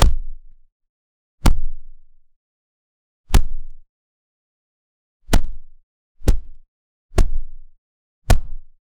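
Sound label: heavy thud of boxing glove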